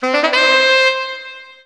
Sax

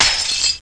Breaking Glass; After Dark 2.0 Modules; Saw

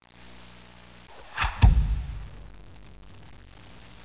ARROW

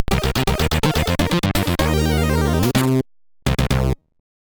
Jingles, SIDTunes

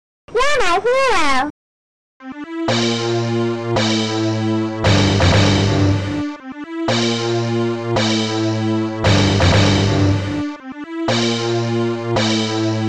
fanfare; Ultimate Games No. 2; Amiga-CD32-CDTV; roadhog

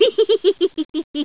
HEEHEE; NOAHDEMO

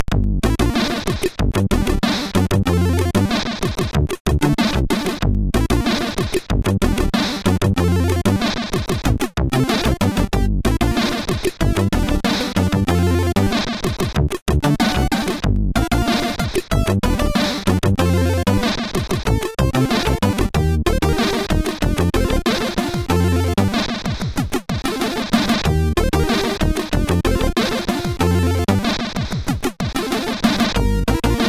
Wild Bass, SIDTunes

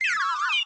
duck; The Interactive Alphabet

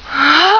BOOB BOMB; Marathon revisited

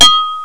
2 BELL

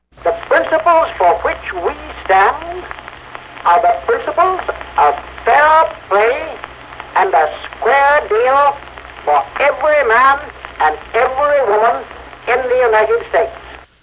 square; Compton's Interactive Encyclopedia (Version 6.0)

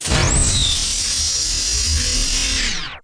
DOOR OP; Messiah; DOORS

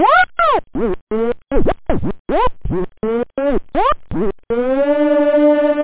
Sweep; OctaMED SoundStudio V1; scratch

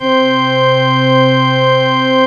Church Organ (C3); X-Wing

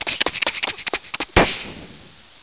Bus Poops

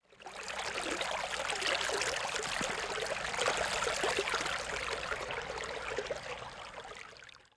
river; Immortal

